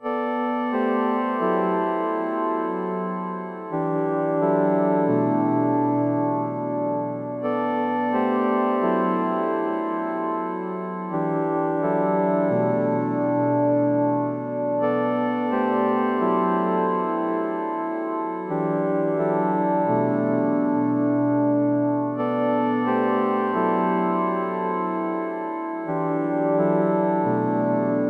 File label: Scene Transition